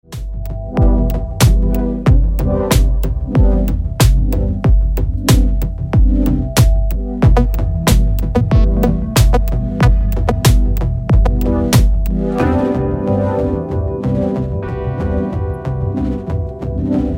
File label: arp